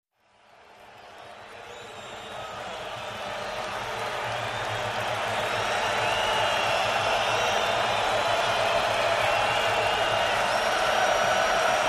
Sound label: Football Crowd Chee, sports arena football